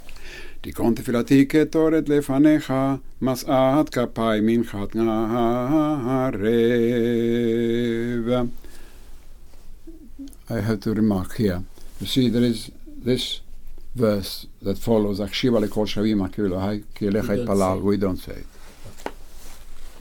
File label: Tikon tefilati, Weekday Minha